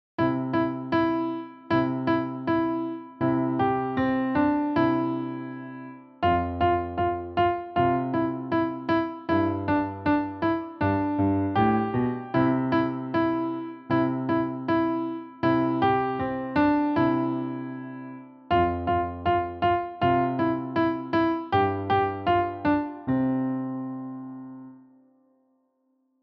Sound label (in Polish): Jingle bells, melodii na fortepian; świątecznych i zimowych melodii na fortepian